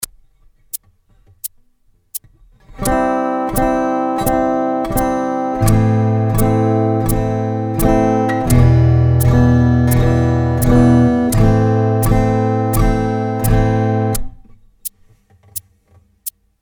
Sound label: Chord practice